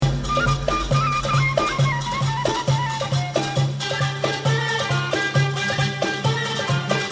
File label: arabian